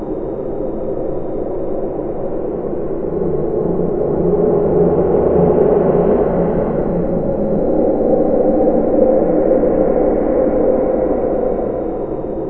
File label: sheller; ambience; aomdc